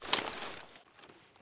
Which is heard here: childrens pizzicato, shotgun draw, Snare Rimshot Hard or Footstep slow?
shotgun draw